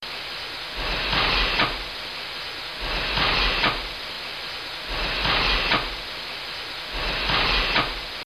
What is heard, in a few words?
pump